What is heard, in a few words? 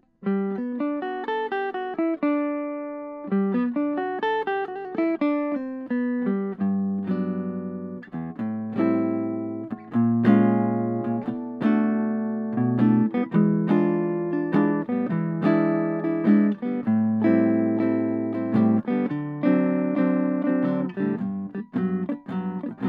gretsch g3900 historic series; 1999